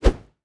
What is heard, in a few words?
fists fire; Garrys Mod